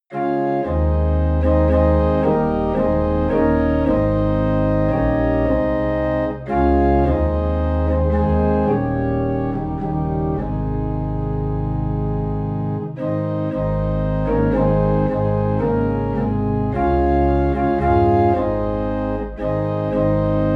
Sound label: Organ Low in A Flat; He Hideth My Soul